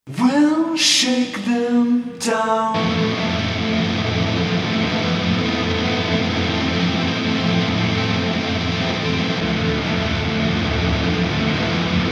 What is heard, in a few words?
Sine Waves